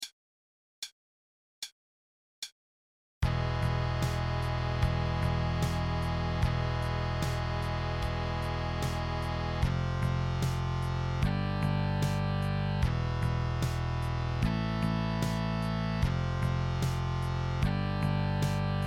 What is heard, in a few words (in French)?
Solo guitare, backtrack